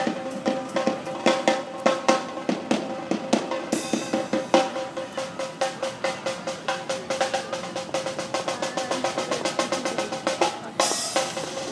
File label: drummer busking